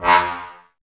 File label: brass, Tbs Mute; 90 s Sample CDs; Miroslav Vitous; Woodwind Brass Ensemble